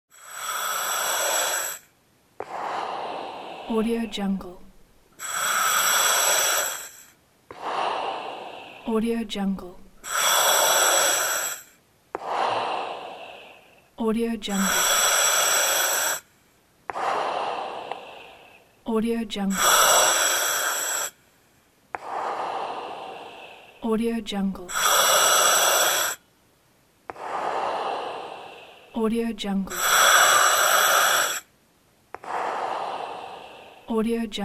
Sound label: oxygen mask